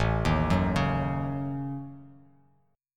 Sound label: A 6th Flat 5th, Bass Standard; A6b5; arpeggiated; JGuitar